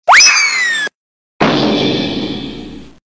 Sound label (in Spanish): resbalon caida clown